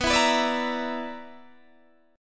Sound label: B-Minor Major 7th Double Flat 5th, Guitar DADGAD